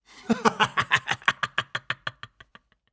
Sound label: man giggling; human